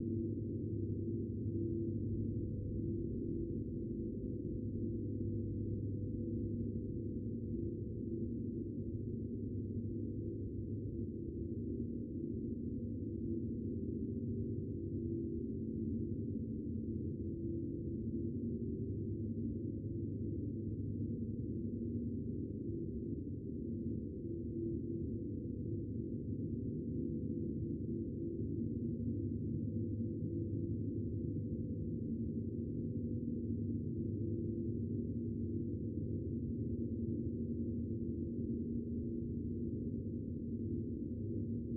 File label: Spoopy amb